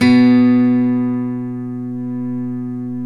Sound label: 12 STRING; OMI - Universe of Sounds